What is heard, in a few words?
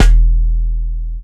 Snare Subsnare, drums